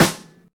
snare d sharp key, Royality free acoustic snare sound tuned to the D# note; snare sample d sharp key; snare drum samples; one shots